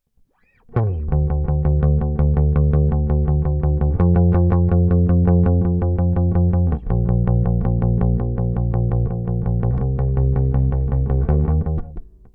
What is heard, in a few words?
BASS